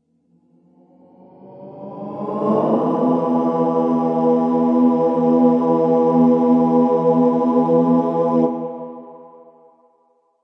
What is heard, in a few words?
reverse to choir; REMIXABLE COLLECTIONS; Ze Franks Pain Pack; pain pack sweeps and fx